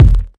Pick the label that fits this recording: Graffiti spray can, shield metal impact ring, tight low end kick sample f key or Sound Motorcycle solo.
tight low end kick sample f key